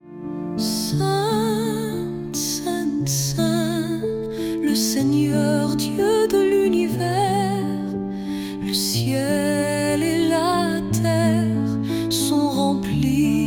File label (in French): Saint Saint female